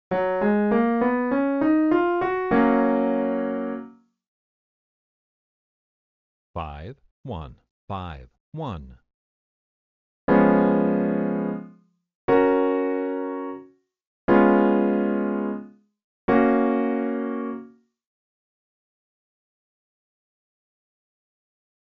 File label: I, V7 (medium); progressions